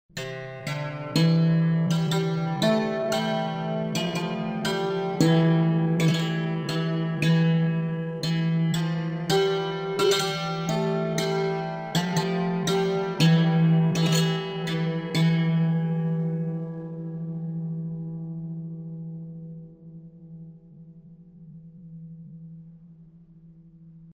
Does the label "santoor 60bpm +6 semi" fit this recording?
Yes